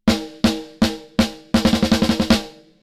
snare TRANSIENT Low mid cut TONAL mid high boost; SplitEQ

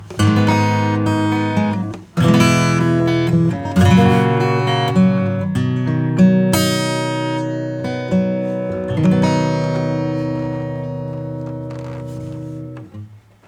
Acoustic Preamp; TH-32

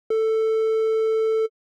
triangle wave; Pigments

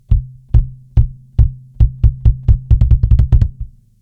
kick Rock 80s w Attack; Joe Chiccarelli

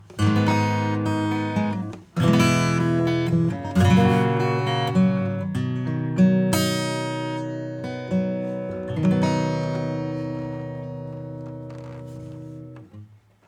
Acoustic bypass; Cinematic Rooms

